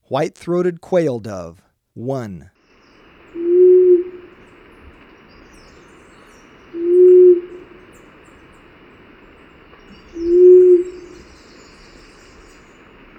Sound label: White-throated Quail-Dove; S. America